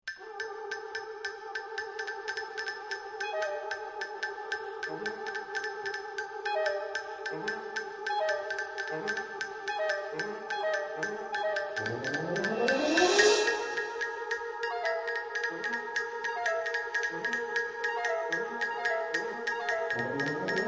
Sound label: Concertino for Xylophone and Orchestra, Fanfare Band